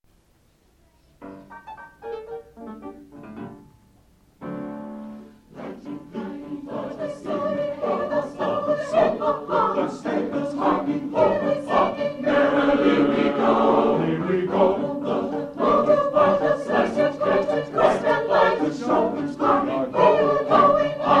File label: The Sleigh concert, Choir